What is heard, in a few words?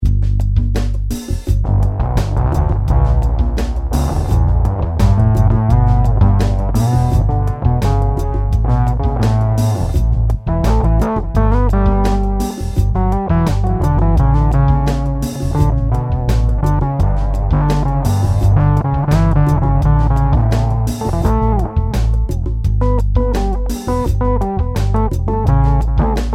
octave solo